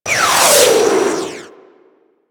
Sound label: Inferno Comet Proj Whiz; Warframe; Ember